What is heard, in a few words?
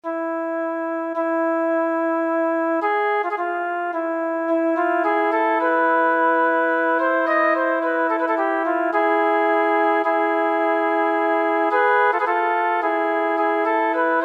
Hava Nagila flute duet